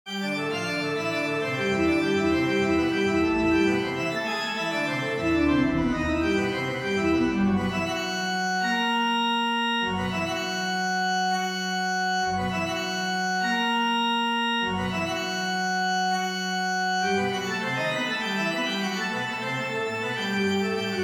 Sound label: e minor midi organ